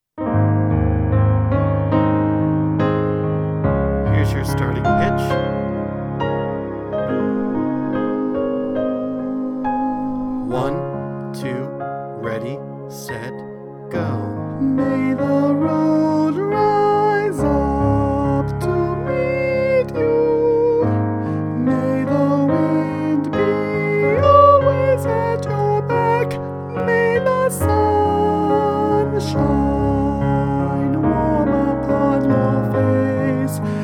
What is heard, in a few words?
benediction alto